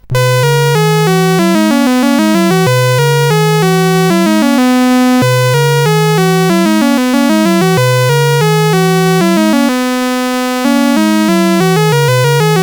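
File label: Number Tumbler, MOS 8580 SID chip music, games; CSG8580R5; hvsc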